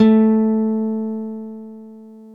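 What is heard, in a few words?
GTR A1 AC; Synclavier Sampler Library; KEYS AND GUITARS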